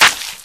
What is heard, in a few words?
Watersplash; Nickelodeon Super Brawl Universe; Android